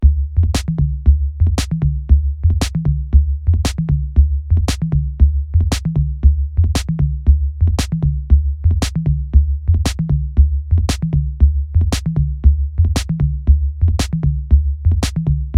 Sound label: changing pattern; VOOMZ